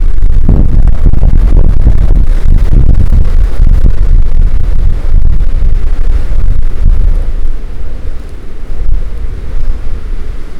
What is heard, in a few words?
Ocean and wind